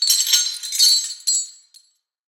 chain rattle

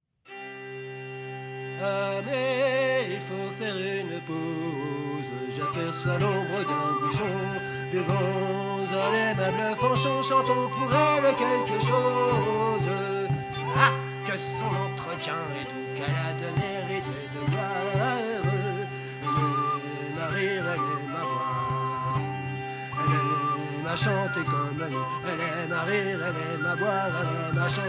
Tavern; Assassin's Creed Unity

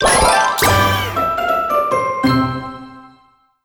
Crunchy Finish

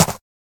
stomp ice; Nut Boarding

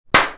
Boom; Blackeye

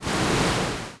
Water wave

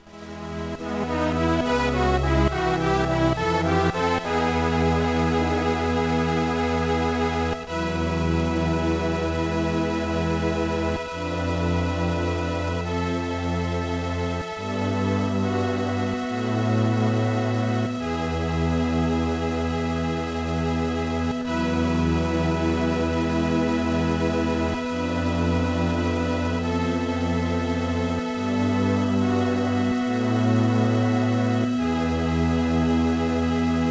Battle Success; Advance Wars 2 Black Hole Rising

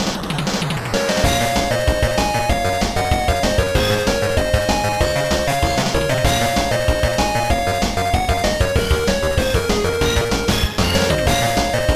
Duet; Super Robot Taisen D GBA gamerip 2003

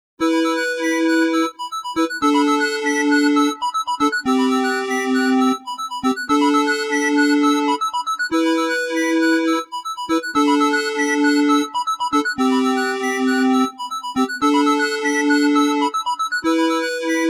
MIDI Synchronization Balance Test BGM

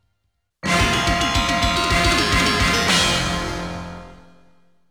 Stage Clear; Konami Game Music Collection

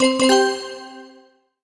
Bell Voice Positive; MUSIC EFFECT; Masked Forces

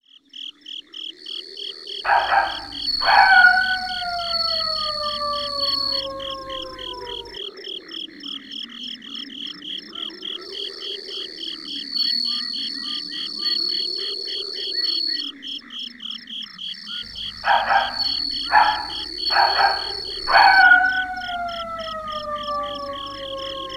Ambiance; battle arena toshinden 3; playstation

